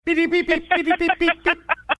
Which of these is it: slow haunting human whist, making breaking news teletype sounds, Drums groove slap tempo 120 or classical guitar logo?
making breaking news teletype sounds